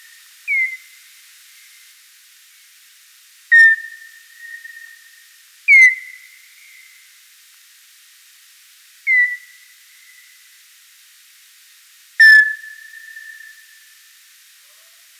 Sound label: N noctula; Allier; M-Barataud